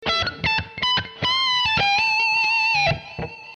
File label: Am2 80-100 solo blues